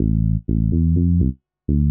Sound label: SNTH BASS HOUSE 125 A; eJay